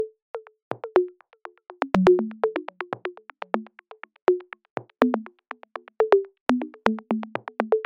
122 BPM Bongos; mmb drum arsenal vol 1

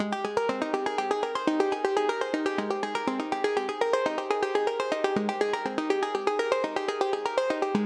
122 BPM Arps D maj C min Tonic G; arp essentials; Cycles of Motion

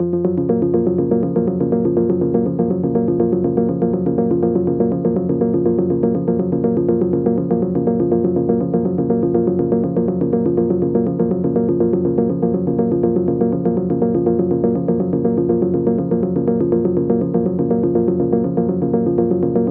122 BPM Arps C maj A min Tonic F; arp essentials

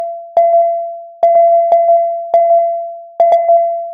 122 BPM Synth Perc; mmb drum arsenal vol 1

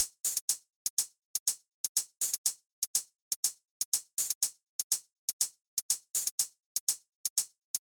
122 BPM Hats Hi Hat; Spectrum; mmb studio melodic

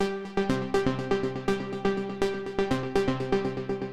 122 BPM A maj G min Tonic G; mmb studio soundtrack essentials

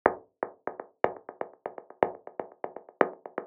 122 BPM Rythm Impacts; mmb drum arsenal vol 1

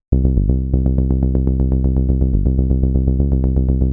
122 BPM Bass Synth G maj F min Tonic C; Pro Bass Synth Studio Kit; MMB

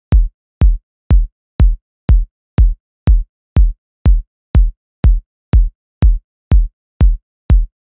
122 BPM Kicks Step 2; mmb drum arsenal vol 1

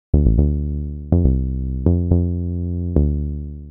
122 BPM Bass Synth Amaj Gmin Tonic D; mmb studio melodic

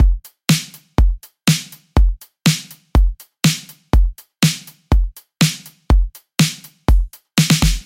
Battery Full Drum, 122 BPM; full drum kit; Essentials Pro

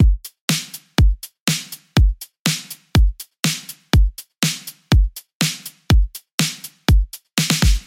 122 BPM Battery Full Groove, full drum kit; MMB; Alchemy

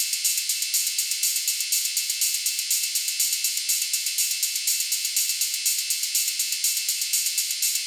122 BPM Ride; mmb drum arsenal vol 1

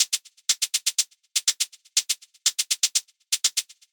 122 BPM Shakes; percussion essentials 1

